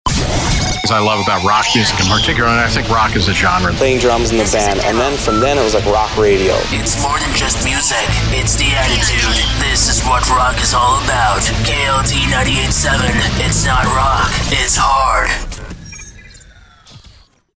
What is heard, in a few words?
ROCK Radio